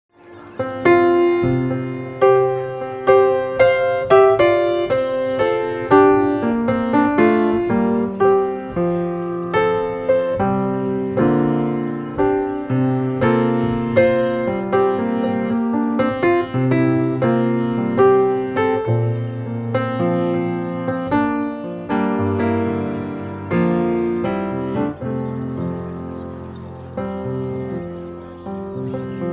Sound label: flourish melody